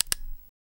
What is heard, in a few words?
button click sound for robotic